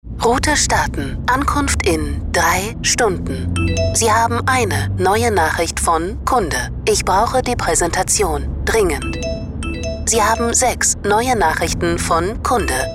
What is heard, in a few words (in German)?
Musik